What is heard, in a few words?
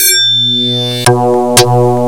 PPG BASS; Rubber Chicken Software Sample Bank